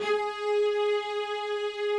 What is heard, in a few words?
strings